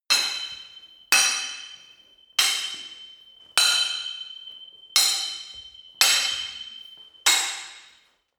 sword hit sword sequence

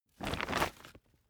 camping chair pack up hard

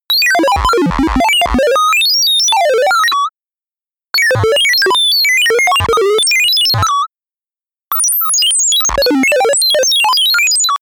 chaos sine distorted

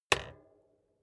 bicycle street kickstand hinge up

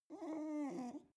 dog whimper

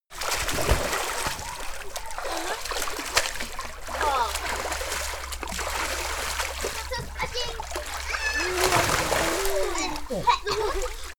children playing swimming pool

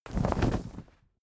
flag small flap

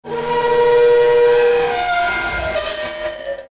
destructive metal scrapes

